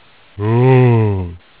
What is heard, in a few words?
zombie alert; rezombie; svencoop